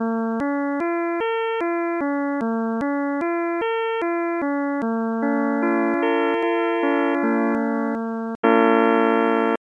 triad sep chord JI; scale; third

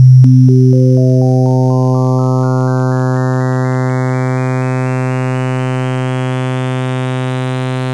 overtones

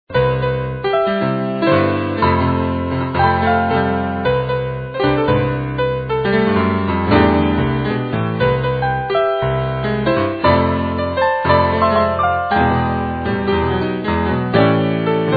casio; akoff

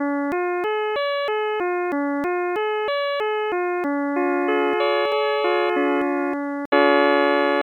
triad sep chord JI; scale; third

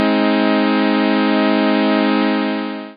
triad ET vib; third

